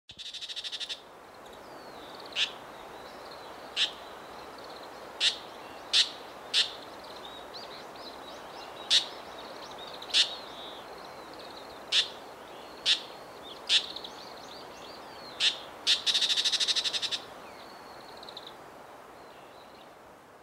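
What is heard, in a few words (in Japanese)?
chigomozu, tiger shrike; yamagata; koe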